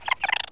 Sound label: Laugh; George Foreman's KO Boxing